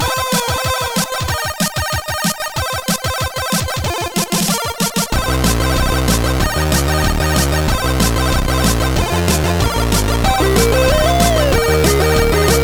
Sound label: eating grunts; Trackers; Modland